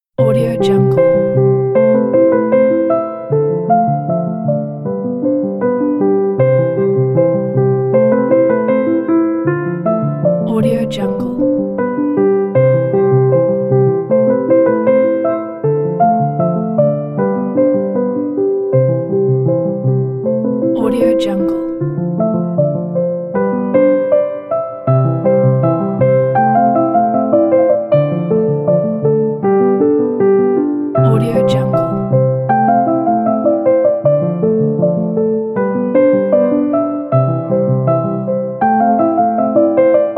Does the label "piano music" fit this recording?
Yes